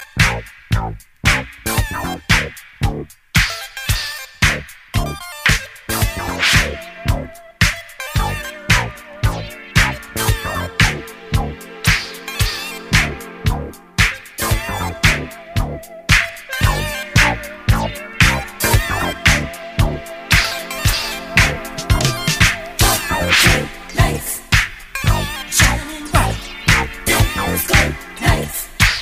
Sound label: Funk